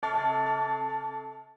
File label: Bell